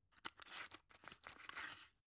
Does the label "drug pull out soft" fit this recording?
Yes